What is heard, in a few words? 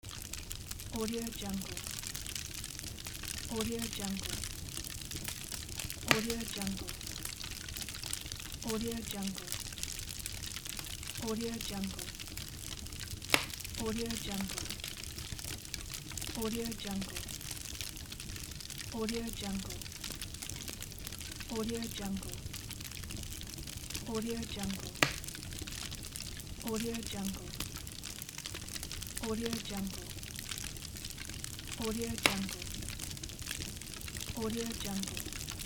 bonfire